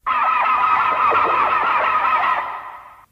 Hedorah Final Form Roar

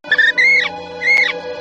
Fairy Mothra Chirp

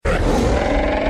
Roar; Verticine

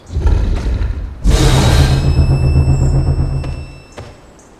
Sonic Siren; Young Sirenjaw

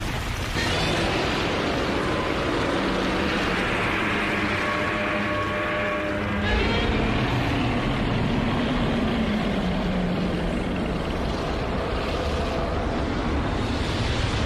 Godzilla Terrestris roar

